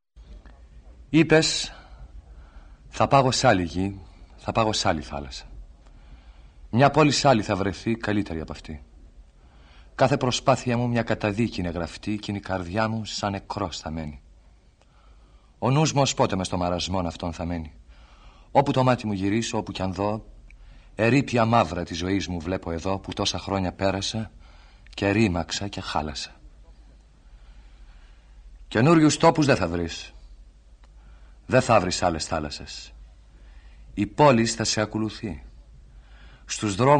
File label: Horn